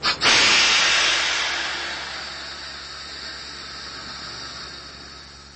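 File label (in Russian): проверка тормозов у состава метро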